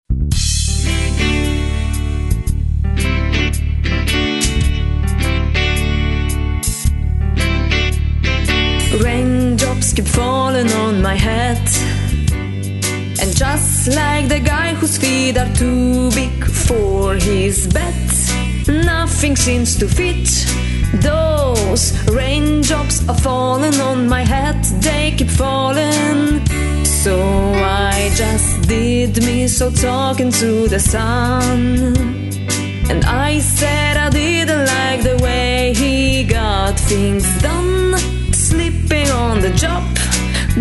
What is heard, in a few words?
raindrops